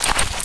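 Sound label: rifle deploy, weapons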